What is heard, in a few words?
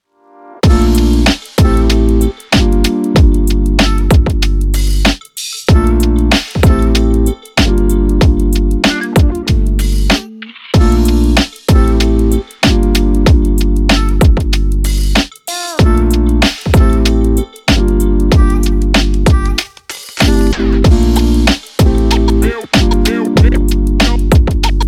hip hop urban